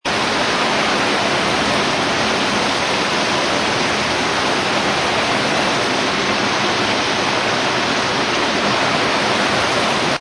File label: ambience weather rain